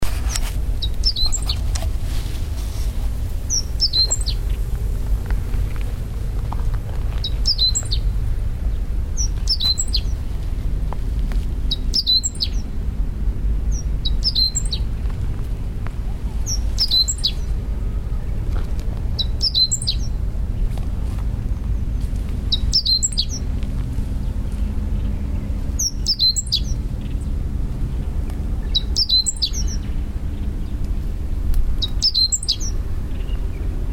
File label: bunting meadow Emberiza cioides castaneiceps